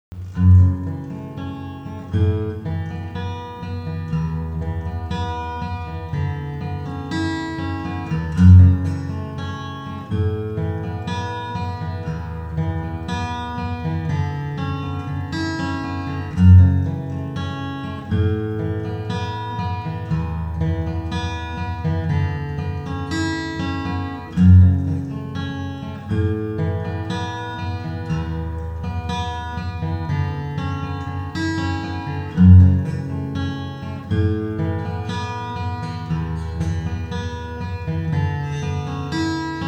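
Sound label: Acoustic, guitar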